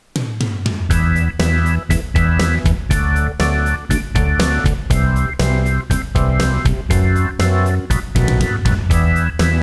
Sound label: music tones